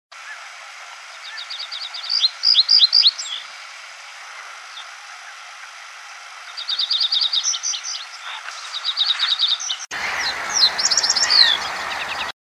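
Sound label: reed bunting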